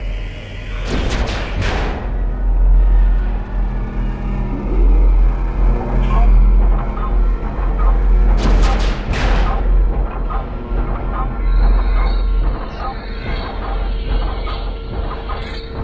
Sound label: Interior Arena Int Ambience (Power Machinery Etc); Starfire